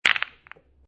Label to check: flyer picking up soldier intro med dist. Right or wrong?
Wrong